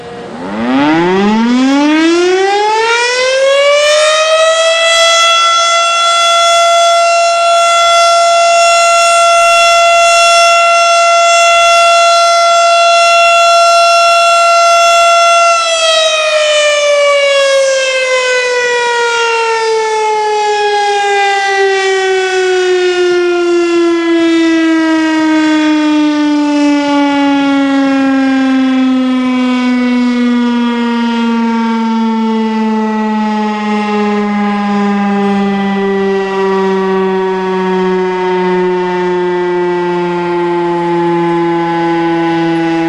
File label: WHISTLE